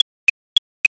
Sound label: ticktock